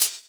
Hhat, Hihat; Equipped Music; Loungin House

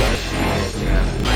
Dark Loop; FX

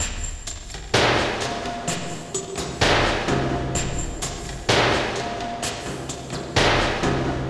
Octave Down Loop 130BPM, DrumLoop Ghost Layers; layering

